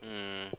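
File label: "mmm", conversational grunts in English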